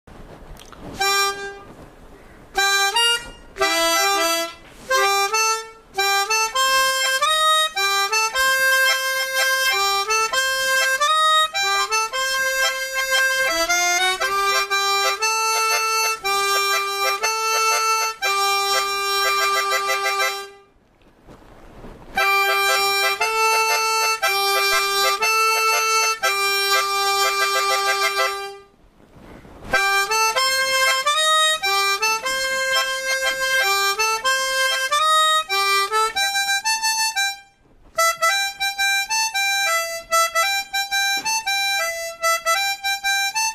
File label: Harmonica song